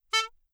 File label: pap horn, bicycle horn, Anechoic; OPENAIR